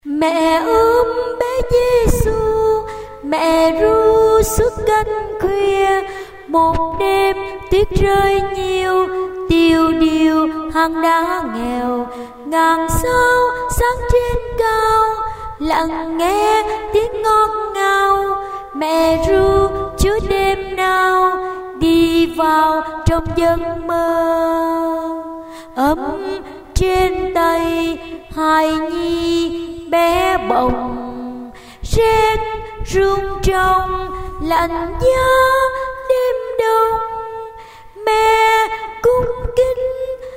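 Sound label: soprano alto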